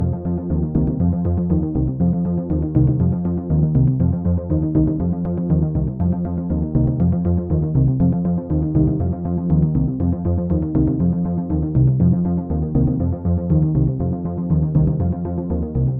Beat Repeat on Master Track

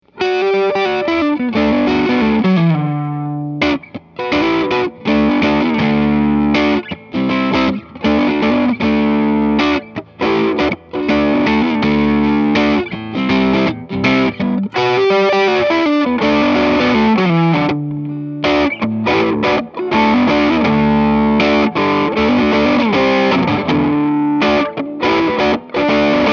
Stacked Overdrive

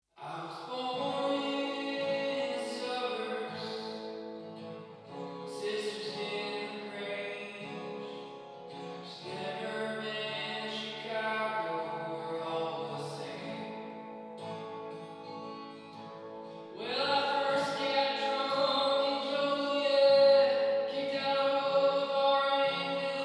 vox verb only

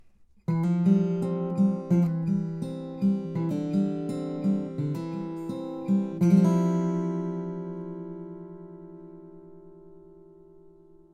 Martin DJr 10E Fingerstyle

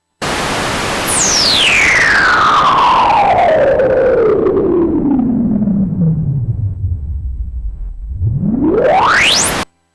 noise rez

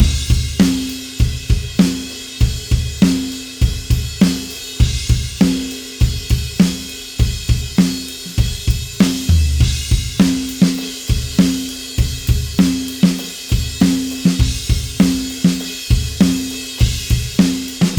Full Mix

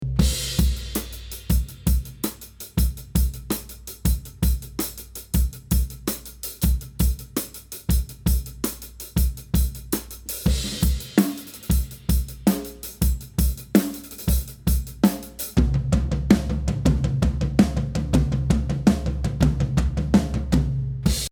Section A All Mics

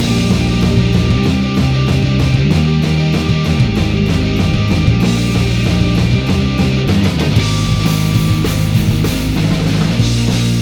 Full Mix with Guitar bass Full EQ

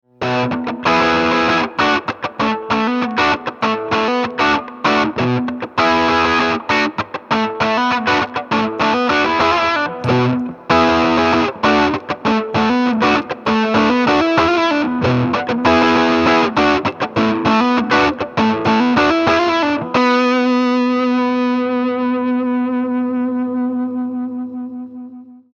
Boost Into Drive

